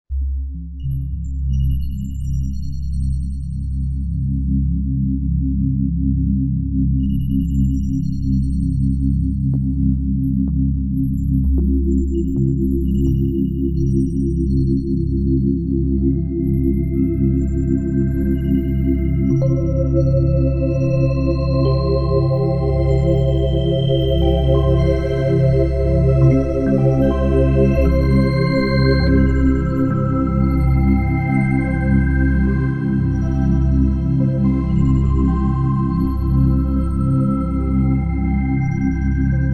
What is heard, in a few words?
Life Drops, yamaha sy77 pads and textures